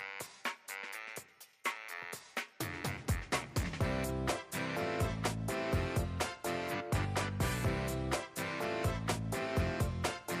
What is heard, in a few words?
music cheerful; bgm